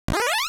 jump up

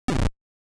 drop block

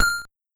Ting

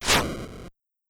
Missle

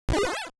ouch

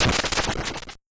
Crumble

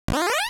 jump up

